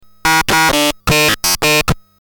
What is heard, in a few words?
speak beep